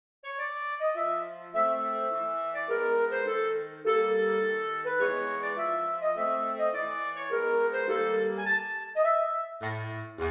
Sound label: Blues, saxpress; SCHULHOFF; Six Jazz Scenes; Esquisses de Jazz - Jazz Scenes; Saxophone Quartet